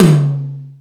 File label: Ambient Rock, drum machine; E-MU Pro-Cussion-1